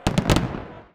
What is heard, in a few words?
explosion, weapons